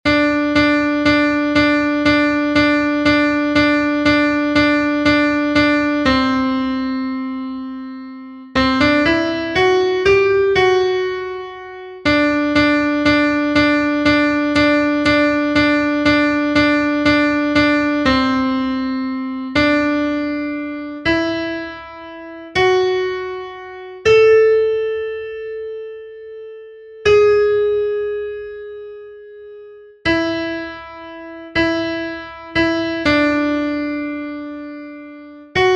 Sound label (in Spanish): CONTRALTO, himnos en piano; cantos del camino